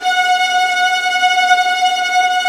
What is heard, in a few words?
VIOLINT G#, Synclavier Strings; ILIO